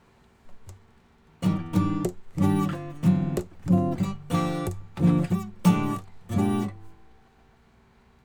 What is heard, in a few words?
Guitar De-noise default